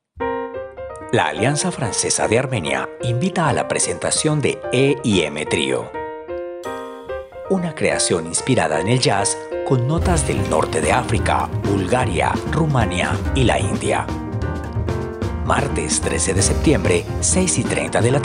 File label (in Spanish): RADIAL JAZZ CONCIERTO